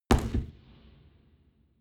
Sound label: gun pop gun pop; schusswaffen